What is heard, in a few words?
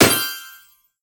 monster dead